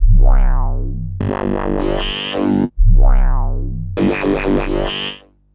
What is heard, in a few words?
Rancid Bass, Drum & Bass; Fluid Dynamics